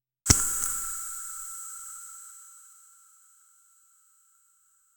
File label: a toxic potion splashes, acid